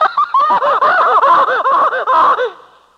laugh goofy kid; ooh ahh

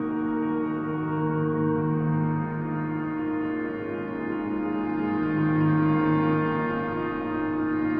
piano, Sad Loops

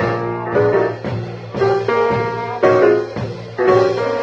piano jazz party, Phonky loops

